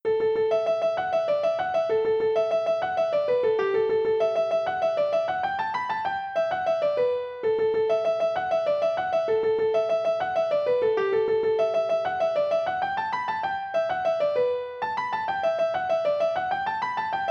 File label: jig; boeuf trad